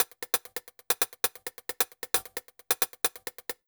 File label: 133 Ragga & Dub Reggae HH; USB Soundscan vol.27; AKAI